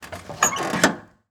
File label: lawn mower clutch; prosoundfx